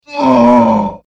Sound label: pain, troll